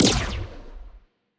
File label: wpn evasion buff muzzle; biogenesis